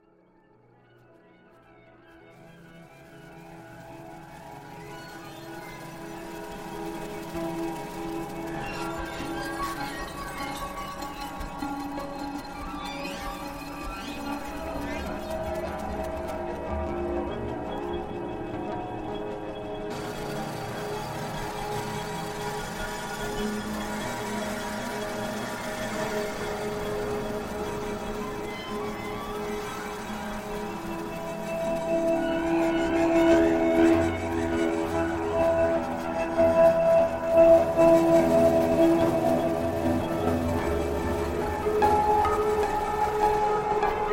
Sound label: machine music, simple machines